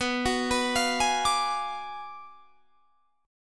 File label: B Minor 6th Sharp 11th B